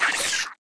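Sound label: DMG SMALL devil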